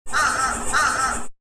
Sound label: crow